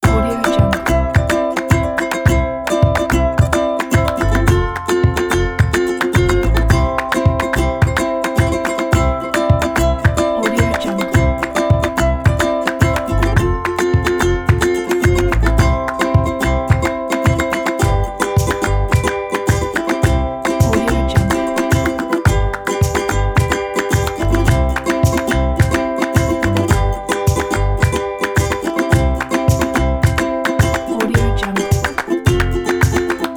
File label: childrens fun ukulele; game homework drawing; alinachop